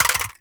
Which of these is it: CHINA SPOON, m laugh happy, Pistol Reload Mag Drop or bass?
Pistol Reload Mag Drop